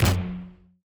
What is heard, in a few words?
hit wave light; CrossCode; battle